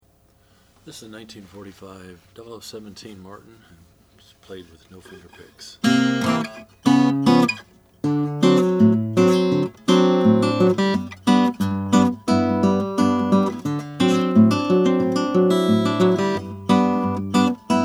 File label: martin 00 17; 1945; natural